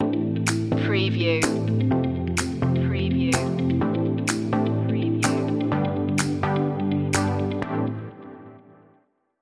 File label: positive direction stinger